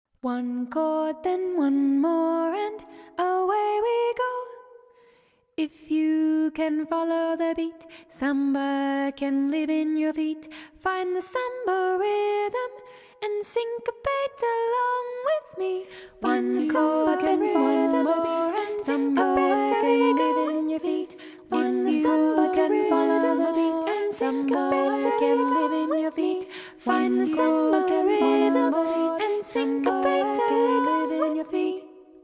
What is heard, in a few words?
samba guide